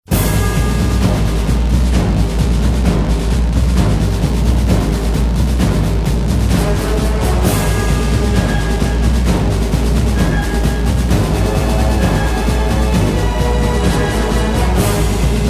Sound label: battle